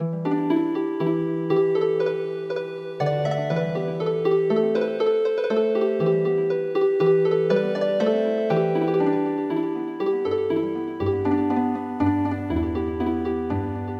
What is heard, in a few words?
Healing Harp, traditional